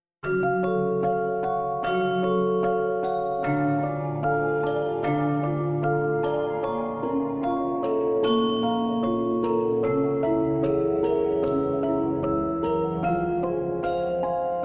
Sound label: Halloween Orchestra Trailer; SafeMusicList